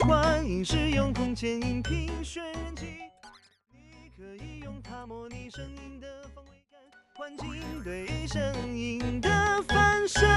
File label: loop FOA 48k; PICO Unity Integration SDK-3.3.2; SpatialAudio